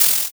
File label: paper